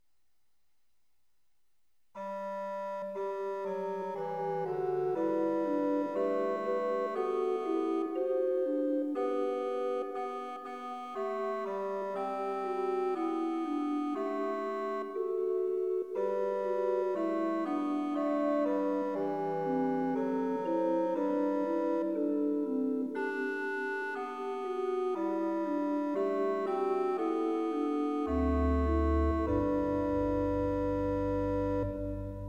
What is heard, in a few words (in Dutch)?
Orgel; Gij dienaars aan de Heer gewijd